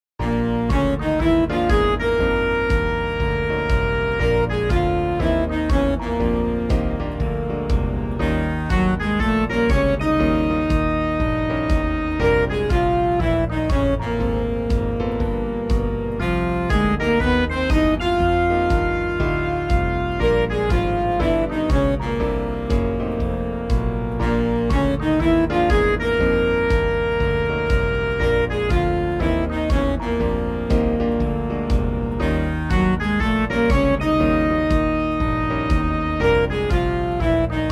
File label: 12 bar blues ensemble